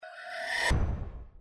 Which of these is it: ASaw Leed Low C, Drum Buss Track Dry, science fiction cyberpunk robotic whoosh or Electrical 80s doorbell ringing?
science fiction cyberpunk robotic whoosh